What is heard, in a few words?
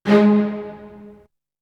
Strings; ZERO G - Datafile DISC 3